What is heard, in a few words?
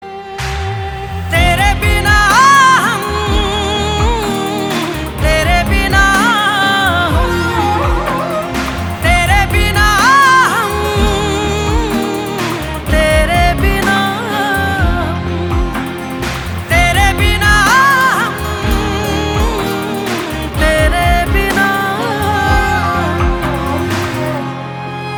Ringtone